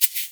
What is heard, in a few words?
SHAKER; InVision Interactive